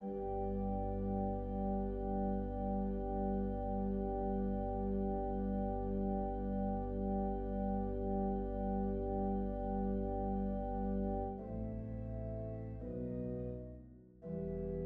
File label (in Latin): Et pastores errant SOLI Tenor and Alto Without Assistance; SaintSaens; OratorioDeNoel